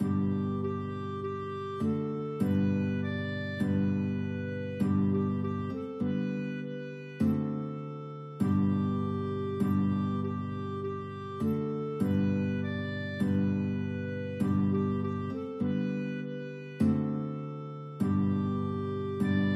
guitar clarinet duet

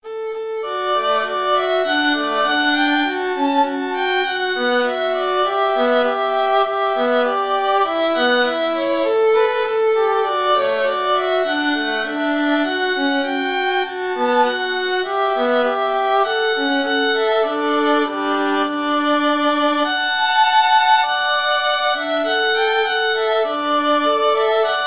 simple gifts, violin duet

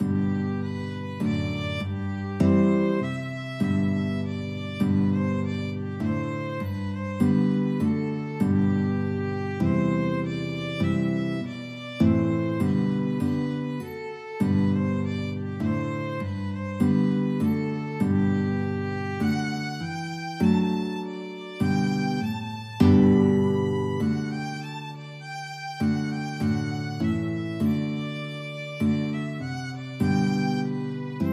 VLN GUIT duet, violin guitar duet

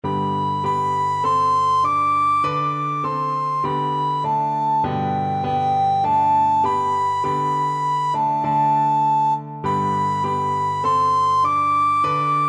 ode to joy, recorder; beethoven

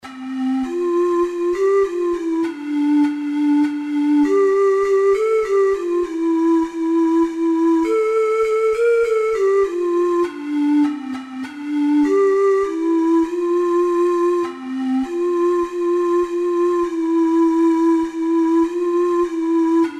we wish you a merry, ocarina four hole

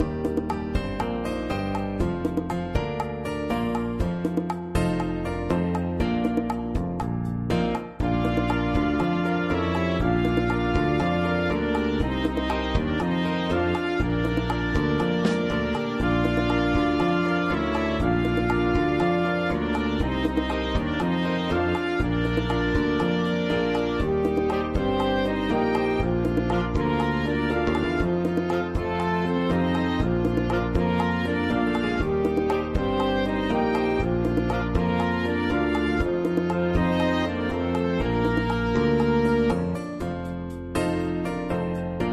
jazz ensemble

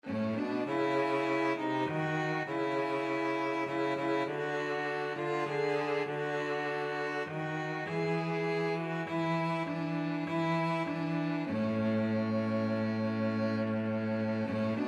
violin cello duet

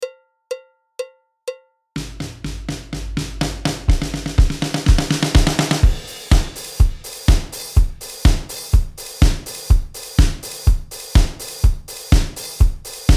classic disco beat, drums

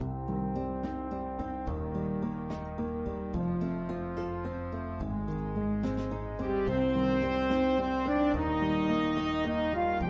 BAND, big band

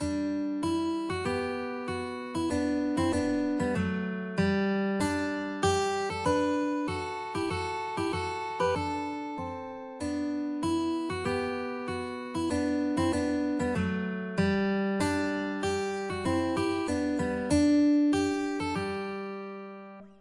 guitar, guitar duet

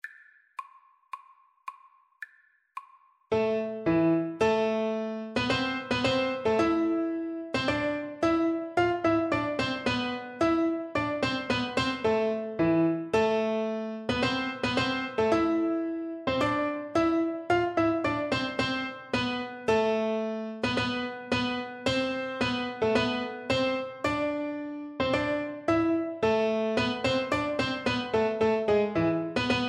Prelude, Flute; L'Arlesienne

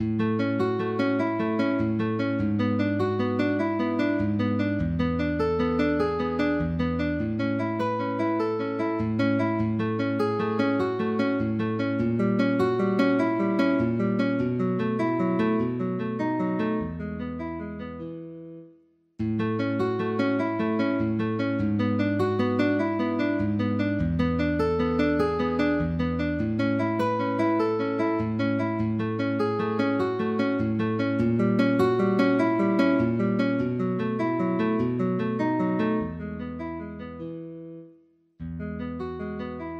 guitar

